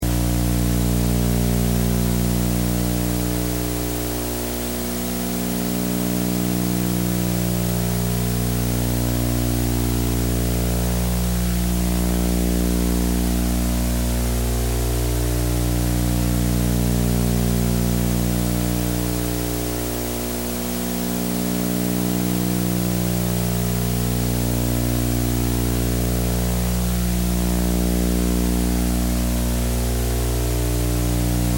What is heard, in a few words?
Sony Tube Tv Digital Static Hum Snow; Electric buzzing and humming with white noise snow; Noise Sound Effects; Lynne Music